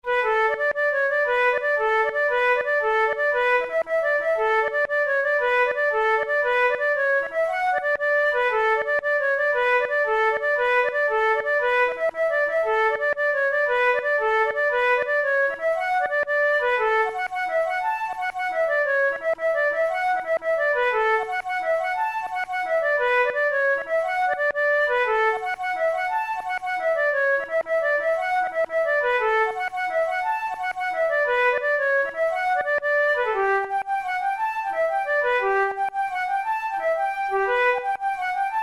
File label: jig, flute